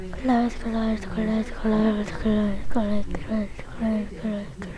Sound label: glide